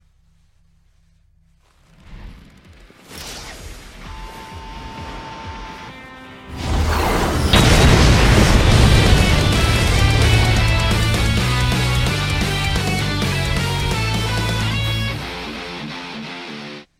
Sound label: serious punch